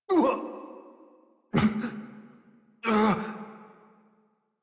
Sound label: Struggle Long, Adult